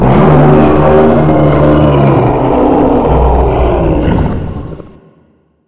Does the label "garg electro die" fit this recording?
Yes